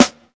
sharp steel snare drum sample b key, snare drum sample tuned to the B note